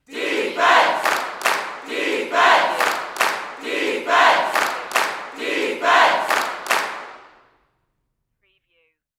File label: Sports Crowd Chant Defense With Clap